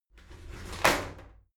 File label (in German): shower slide doors open regular, tueren